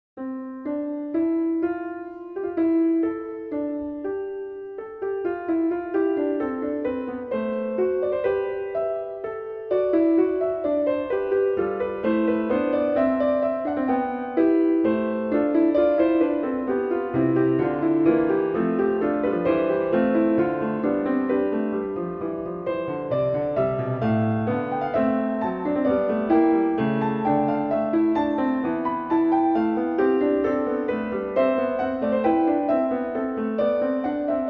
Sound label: Fugue in C Major; BWV 846; BACH; WTC I